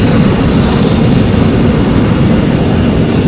jet; Mafie 1

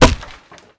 wood; Mafie 1

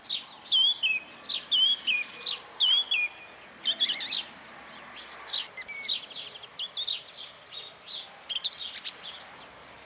bird; Mafie 1

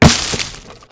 bush; Mafie 1

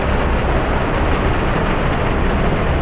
ferry; Mafie 1

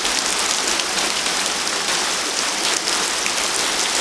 hydrant; Mafie 1

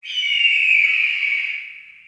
eagle; Mafie 1

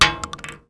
metal; Mafie 1

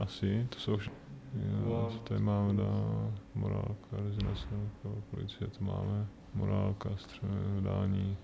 whimper; Mafie 1

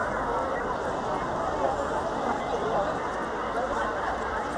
ambi people; Mafie 1

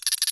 vermin; Mafie 1

